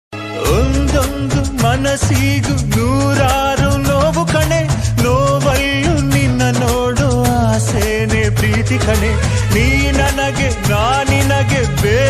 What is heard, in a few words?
kannada love feeling